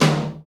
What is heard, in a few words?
TOM, KIT Real-Kit; 90 s Sample CDs; Sound & Vision - Gigapack I CD 1 (Roland); Real-Kit s10